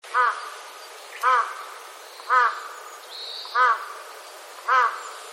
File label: crow